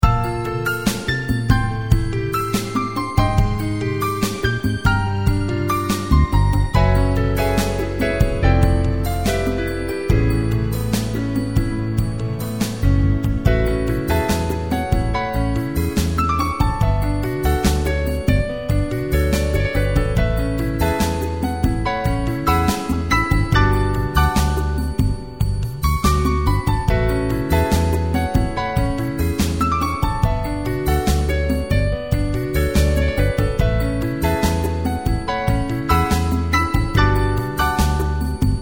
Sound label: easy fade